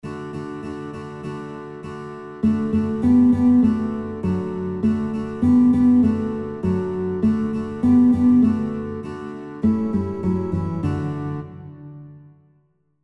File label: Guitar Heile Heile Segen; Guitar Songbook; kinderlieder gitarre playalongs